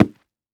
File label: Foley Footstep Cowboy Boots Dirt Debris Jump Single; Foley Footsteps